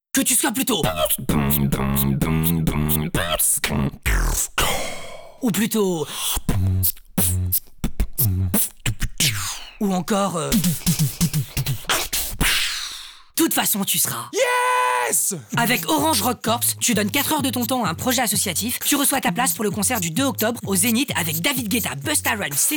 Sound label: rock corps beat